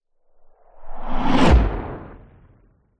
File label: sound design cinematic whoosh dark fast horror impact; zapsplat